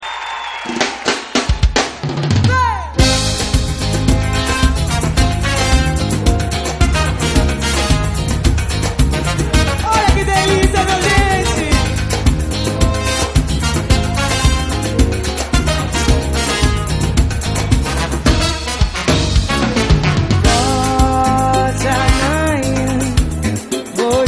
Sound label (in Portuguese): ao vivo em salvador